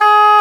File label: Oboe D'amore; Roland L-CDX-03 Disk 1; Oboe 4-8